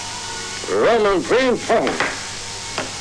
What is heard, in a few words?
pingpong; stanleykubrick; lolita